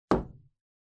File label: Duct Tape (Put Down)